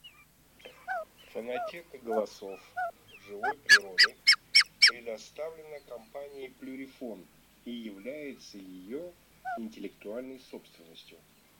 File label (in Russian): Фазан петух Fagiano; plurifon